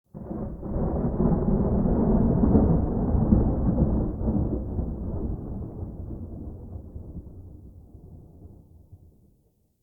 Night Rolling Thunder; Night One Offs; Ambience

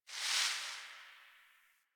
Garbage Plastic Bag Rustle; Warframe; Ambience; Nineteen Ninety Nine

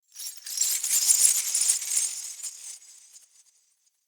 Sky Fortress Chain Move Trippy; Ode Sky Fortress; Tunnel

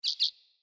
Bird Double Chirp; Pothia Bird Guide

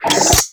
Sidearm Charge; Wraith

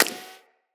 Bullet Impact Ricochet

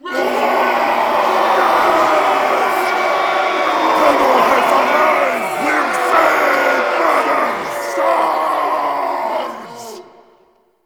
Battle Arrive Stinger; Makvoss Cannon

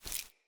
Corrupted Sproutfolk Grunt Foley Step; Enemy

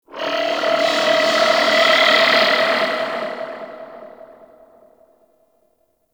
Pursuit Space Ship Call; Warframe; Grineer; Vehicles

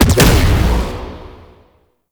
Alt Fire Explosion; Weapons; Warframe; Corpus; Tenet Quanta

